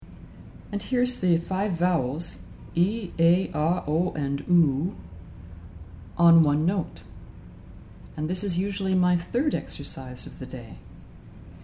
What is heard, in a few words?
EE AY AH OH OO ME SINGING